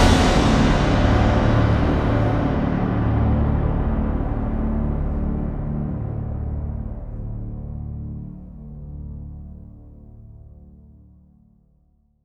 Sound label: ORCHESTRA STAB D